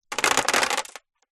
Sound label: household necklace small stones pebbles set down plastic surface